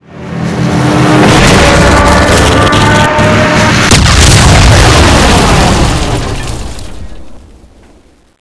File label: crash; tobruk